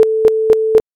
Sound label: volume rect 8 step 4hz